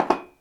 Cup Place Down On Tile Table

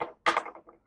Falling Lighter

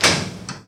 Metal door

